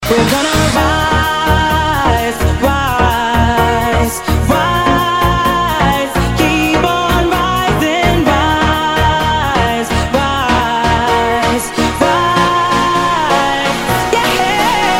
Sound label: Remix